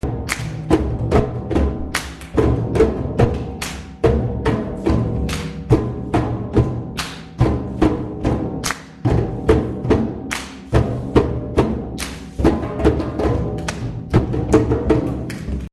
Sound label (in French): anim tambour enfant